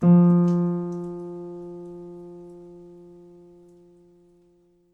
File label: piano sample